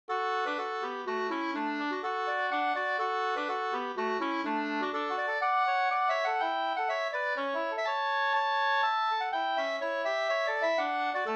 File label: mazurka; Kolshalsen e Konrad Bergkvist Sd 2st